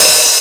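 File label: TOM Hat Open, Sequential Tom drums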